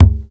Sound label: present steel kick drum sound F sharp key, Royality free kickdrum sample tuned to the F# note